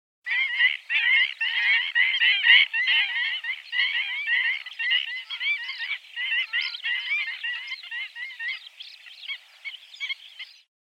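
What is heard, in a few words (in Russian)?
hodulochnik golos, black-winged stilt voice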